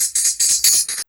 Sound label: LOOPS